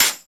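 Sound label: PER PROCU, Procussion, percussion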